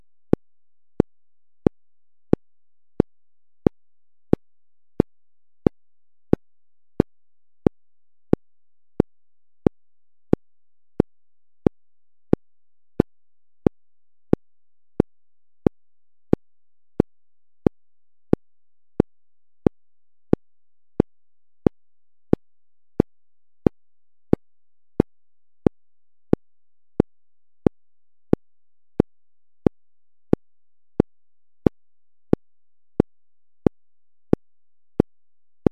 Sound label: bpm 090, metronome